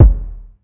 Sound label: rich low end steel kick drum sound e key; Duration 0:01